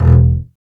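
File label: strings bass, contrabass marcato; String Master Series; Cb Bowed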